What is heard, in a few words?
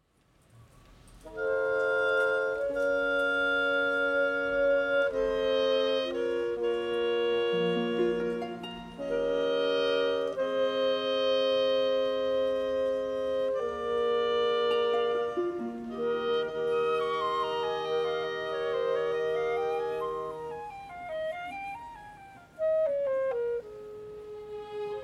Foley Soul Bass Chorus